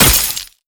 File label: Hit Light Ice Add; netent